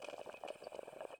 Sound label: tone; seasonal